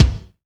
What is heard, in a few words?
MIX BD, AKAI S6000, DRUM RYTHM; AKAI S6000 CD-ROM - Volume 1